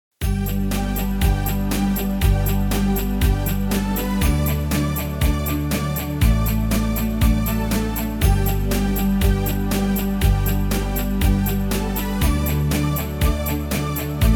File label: Background Music